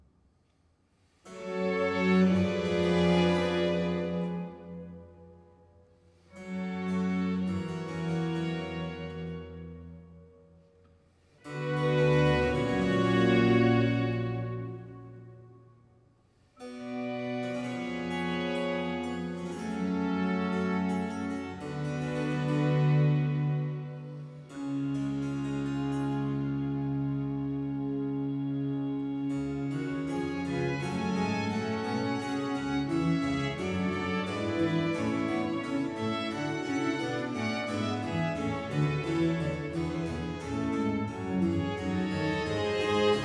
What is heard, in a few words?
Concerto Grosso in B flat major; Corelli